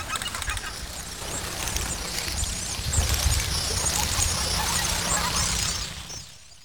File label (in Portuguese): baleia; ANIMAIS